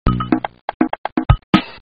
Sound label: drums